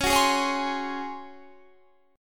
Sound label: Db Major 7th Suspended 4th Db down Guitar Standard; strummed; JGuitar